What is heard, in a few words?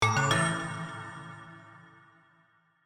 Triumph Jingle